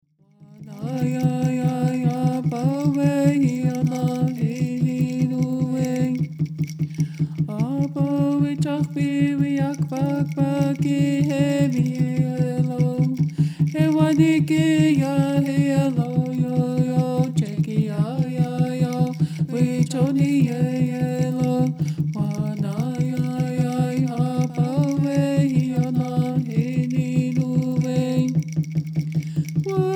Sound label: Peyote Song